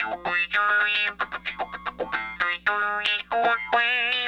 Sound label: Raw Funk Guitar